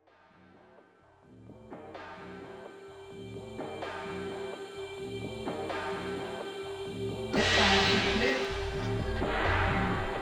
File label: Drum n Bird